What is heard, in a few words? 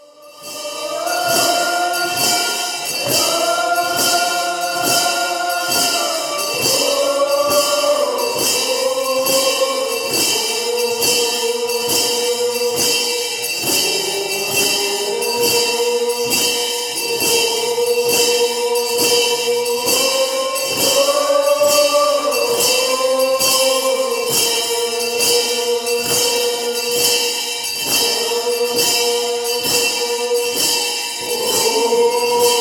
Shakers native